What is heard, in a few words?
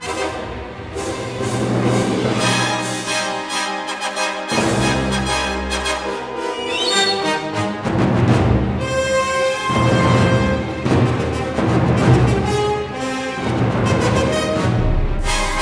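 battle loop, music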